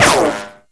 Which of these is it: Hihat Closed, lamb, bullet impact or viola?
bullet impact